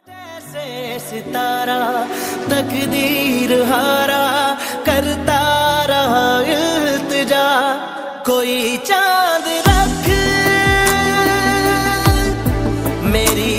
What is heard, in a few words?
Ost pakistani ringtone